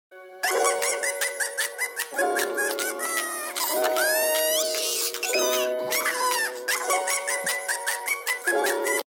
bebe riendose

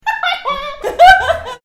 risa

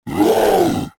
rugido de oso

